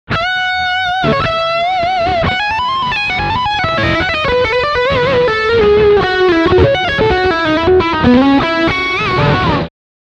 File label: Lead Rear humbucker